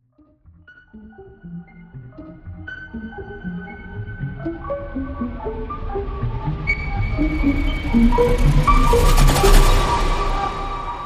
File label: Surreal Dream Flute Rise